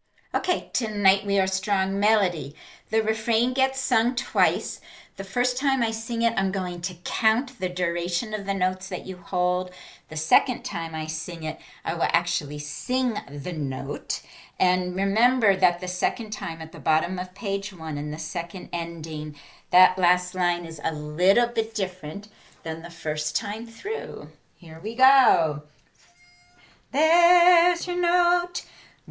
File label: melody